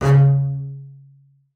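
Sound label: marcato C#3 right, bass spiccato; Xsample VOL-5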